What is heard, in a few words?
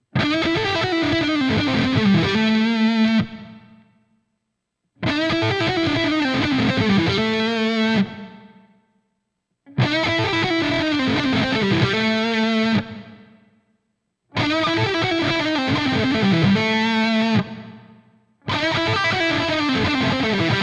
pickup mini humbucker lead full; mh1 2 mini humbucker dual rail; panico pickup